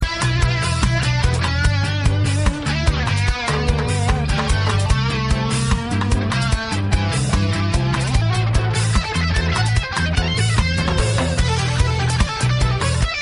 symphony for reggae

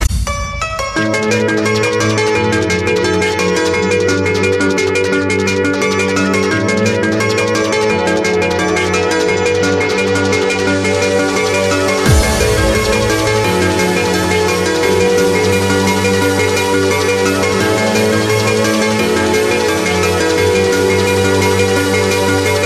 happy hardcore

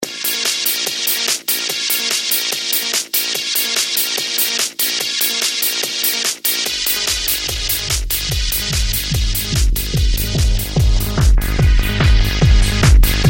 technoloop

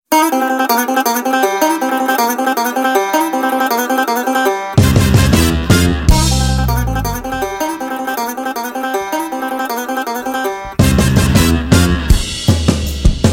guitar remix

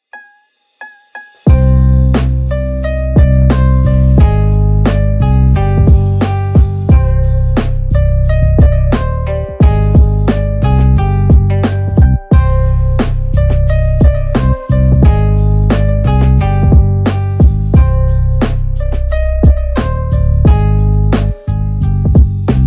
bass boosted

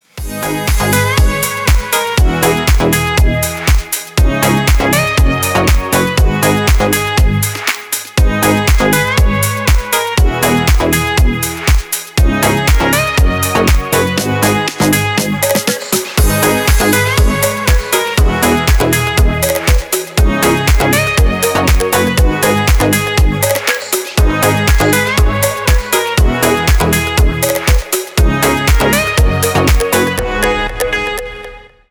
instrumental

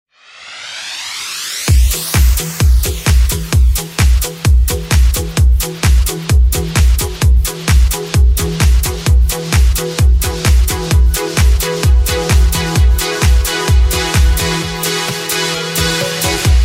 cool beat

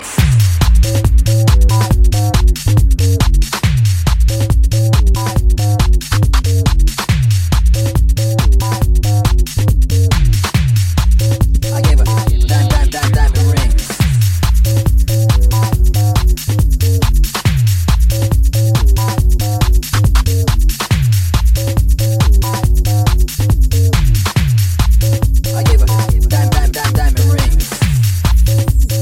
bassline track

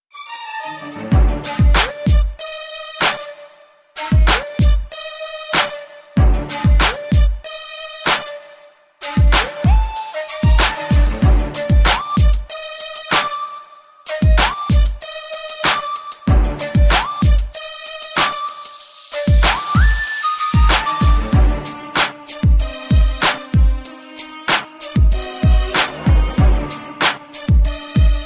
excellent instrumental

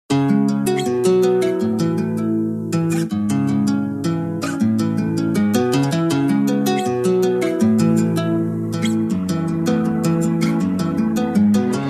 guitar intro

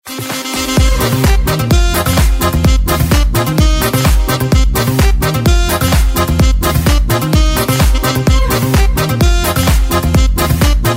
forro

